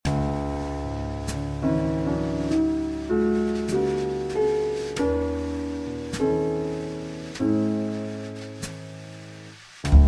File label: Sleep Jazz; Focus at Work Jazz Playlist; Jazz Music For Studying